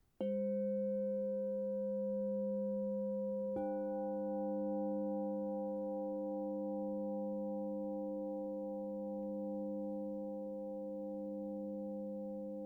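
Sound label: LOW G D sharp, crystal bowl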